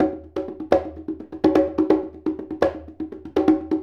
Congas; Essential Percussion

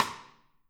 Drums Percussion

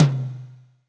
R-8 dry tom; EdgeSounds; Drum Mashines; R-8 DRUMS

